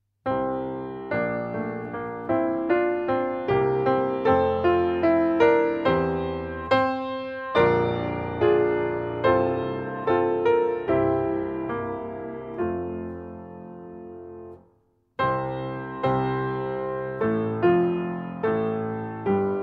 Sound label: piano